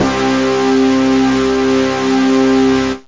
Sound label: synth; Zoom - Release 2 (1996); Active Software; synths